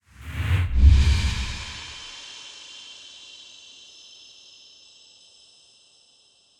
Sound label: Forest SFX, Tree Pollen